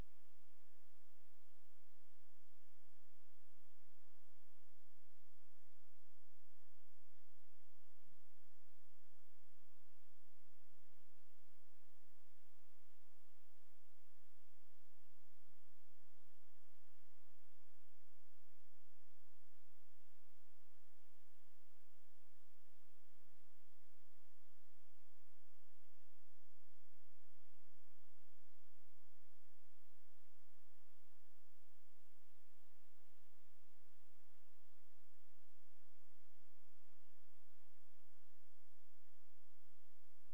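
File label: hydrophone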